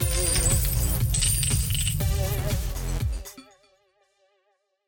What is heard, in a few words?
coins; Lucky Fortune; Wazdan